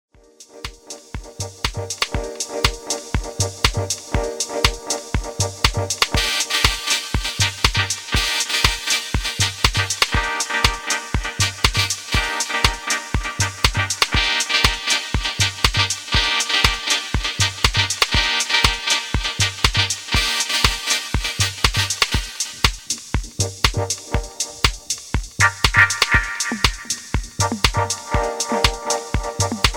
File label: Groove Instrumental Mix